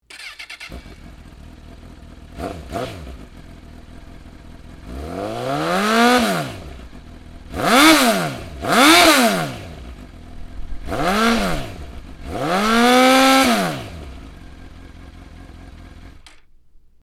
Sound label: CBR1000RR Punched Stock End Can, exhaust sound